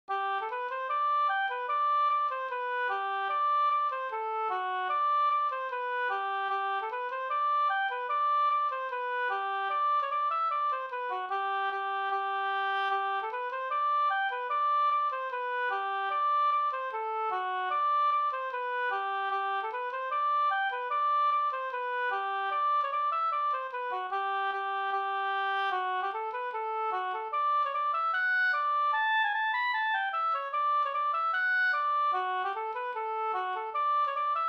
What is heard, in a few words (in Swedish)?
schottis; Anundsjo